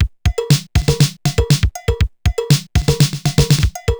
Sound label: TR 505 (120BPM), beats; musicradar; cyborg beats